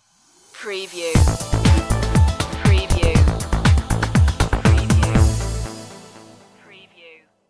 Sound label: neutron groove stinger